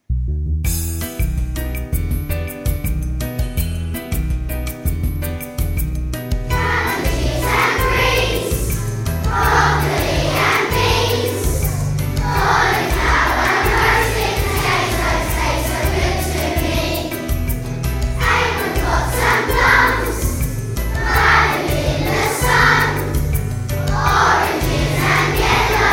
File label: harvest samba